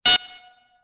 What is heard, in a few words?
beep, c4; Soldier Of Fortune II Double Helix; XBOX